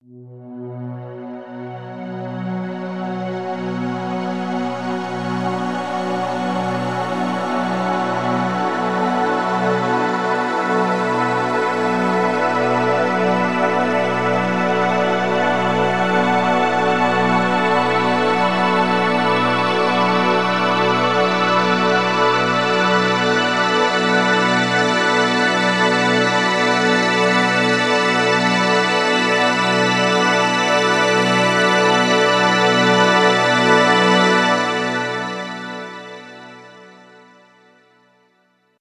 140BPM Symphonique B, Melody Fills; 24Bars; Vengeance Samples

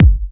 Hard Kicks; Vengeance Samples

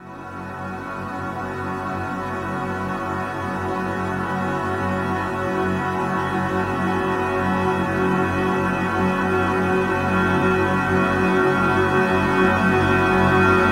140BPM Trance Athmo F#, Melody Fills; 8Bars; Vengeance Samples

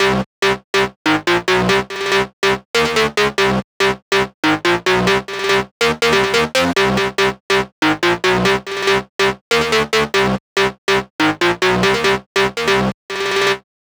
Special Sounds Root C 142 BPM; vengeance essential club sounds-3

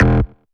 Synth Bass; Vengeance Samples; VEE Synth Shots

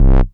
Bass Multi Rev C; Vengeance Samples

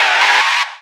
Synths C; Vengeance Samples

